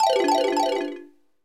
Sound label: Power Down; SMG